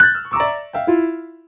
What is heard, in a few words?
piano; vendetta